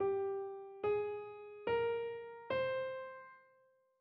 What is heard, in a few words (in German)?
Tetrachord Phryg g auf; Tetrachorde